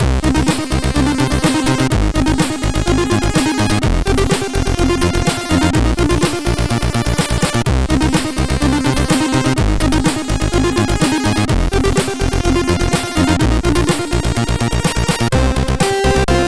Crack Outro Tune

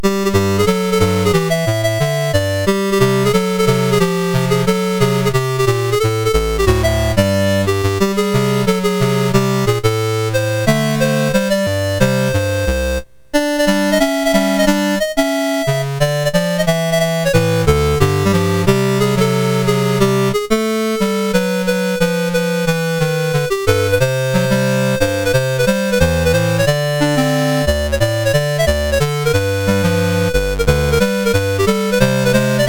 MOS6581R4

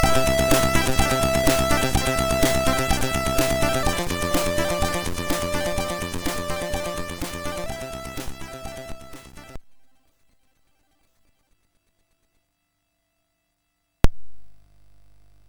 Hires Demo Kit, MOS6581R3

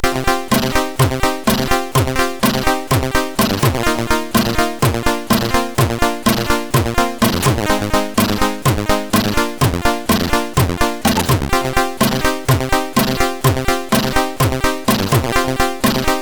Intro Tune, sid CSG8580R5; hvsc; Moon

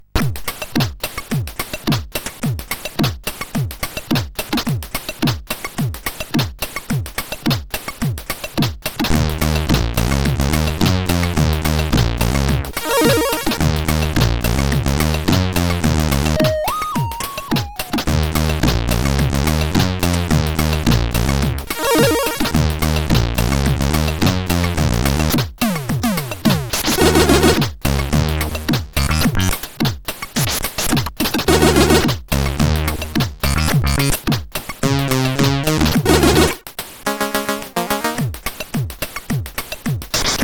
Pulse Machine sid CSG8580R5

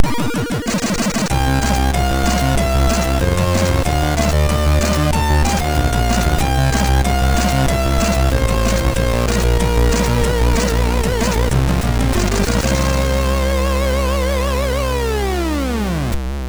Jingles, MOS6581R2; sid

